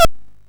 sid MOS6581R2

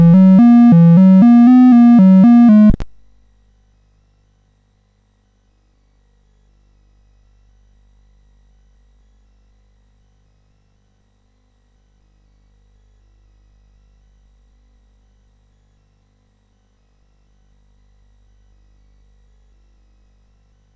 Traffic Jam BASIC, CSG8580R5; sid

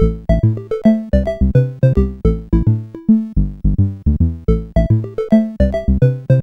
8580R5; sid; CSG8580R5

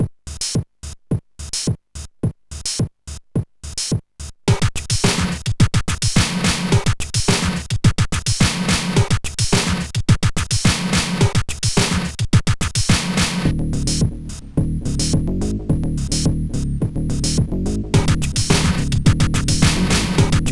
hip-hop; X-Beat Pro

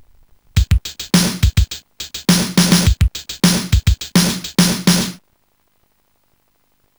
drum; Sonix Music Driver

